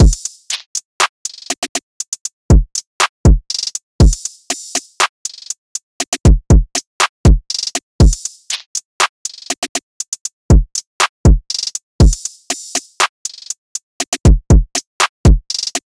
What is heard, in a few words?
Slow Crunch Drum Loop - 120 BPM; Cymatics; Mystery Pack Vol 4